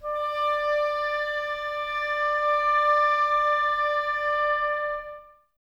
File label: Clarinets, Wood Winds